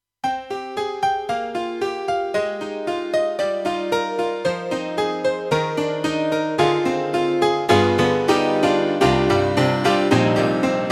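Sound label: YAMAHA TG300 Acoustic Piano 2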